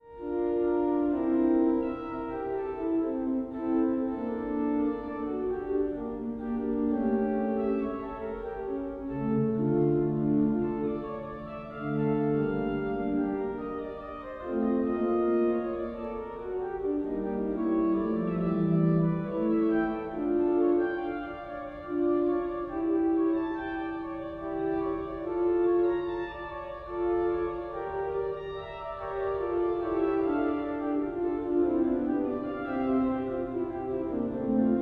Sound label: Chaconne, organs; Bach